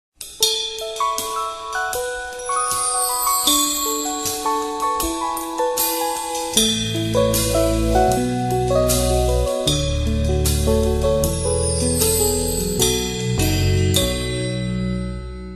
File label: little chimes